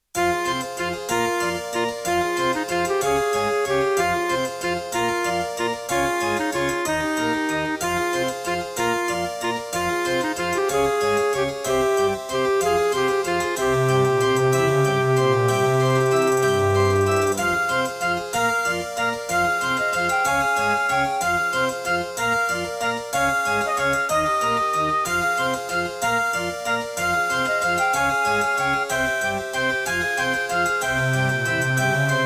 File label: Serdaco Dreamblaster X1; Death Gate